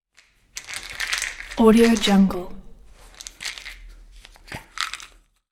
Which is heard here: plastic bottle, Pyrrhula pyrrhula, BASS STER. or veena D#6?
plastic bottle